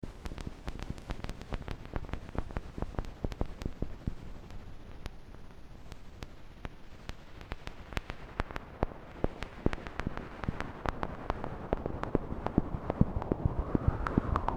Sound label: Filter Pings; Workshop Soma Pulsar 23